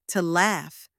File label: to laugh; emotions feelings vocabulary